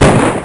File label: blunder